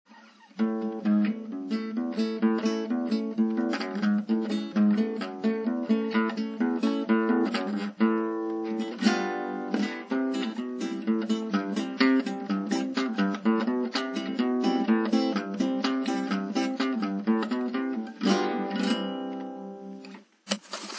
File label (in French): marche